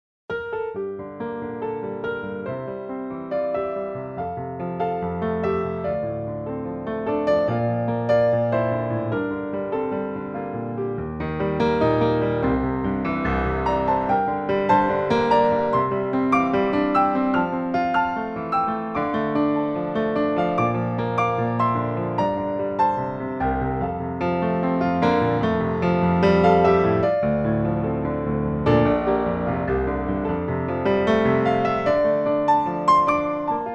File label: Medley, Piano